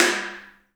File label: HAIRCUTS, KENYA DRM, Heart of Africa; Spectrasonics